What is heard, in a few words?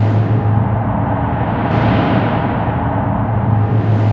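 tunnel ambience; hl2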